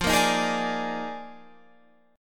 D sharp Major 7th Suspended 2nd Suspended 4th F

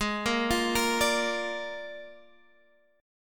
Bb 7th Ab

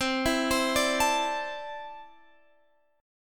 C 6th Add 9th C down Guitar Drop D

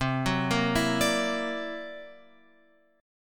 C 9th Flat 5th C; C9b5 Chord {8 9 8 9 X 10} arpeggiated

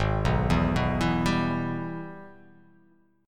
Ab Minor Major 7th Sharp 5th, chord; arpeggiated; JGuitar

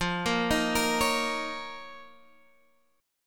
D sharp 7th Suspended 2nd F, Guitar

